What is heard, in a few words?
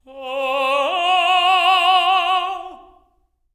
LYRICAL; Spectrasonics; Symphony of Voices